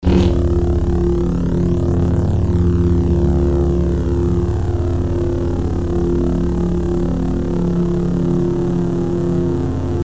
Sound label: Saber; FXHome